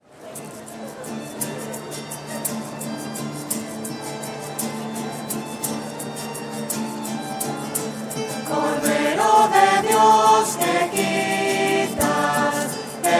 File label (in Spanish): cordero de dios voces; misas 2012